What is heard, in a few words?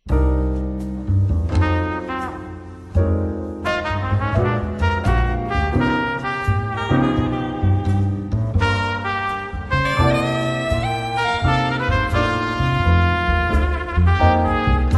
Melody Counter melody Harmony Bass Rhythm